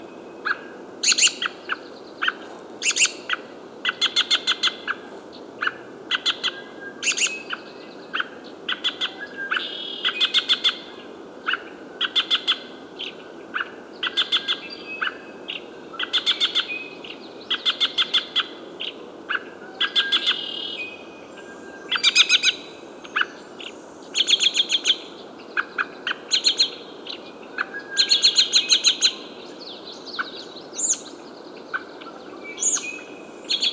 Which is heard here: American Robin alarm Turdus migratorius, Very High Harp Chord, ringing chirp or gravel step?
American Robin alarm Turdus migratorius